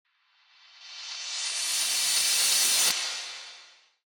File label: riser